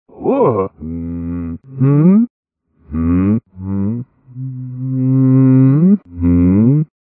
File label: hmm; reverse